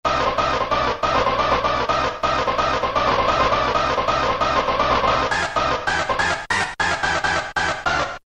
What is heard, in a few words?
Rave Hit; Roland M-DC1